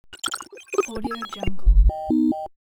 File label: ui connection